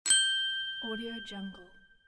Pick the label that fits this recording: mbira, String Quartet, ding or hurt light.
ding